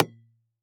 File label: Impact PVC Pipe Hit By Plastic Tube